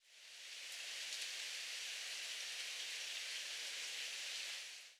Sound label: Rain Light